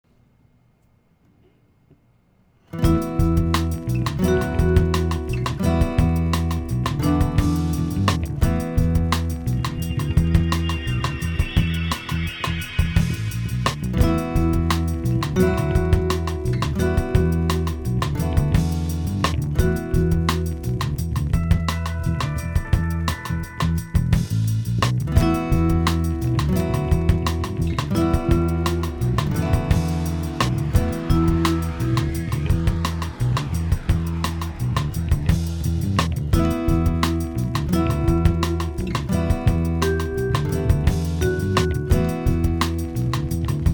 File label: Mood, dreamy